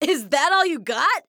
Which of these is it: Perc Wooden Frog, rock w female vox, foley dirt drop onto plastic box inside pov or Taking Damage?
Taking Damage